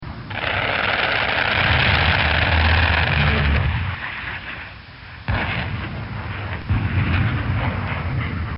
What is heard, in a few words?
F 15 Eagle Strafe Ground Target; Computers